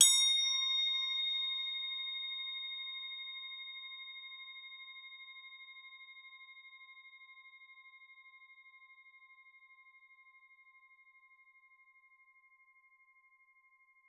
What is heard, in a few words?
crotales C4; Xsample VOL-4